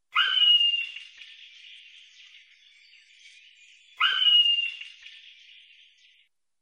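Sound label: obyknovennyy kanyuk golos, common buzzard voice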